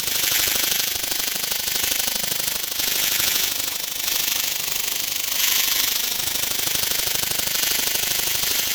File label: Obstacle Course Laser; Warframe; Clan Dojo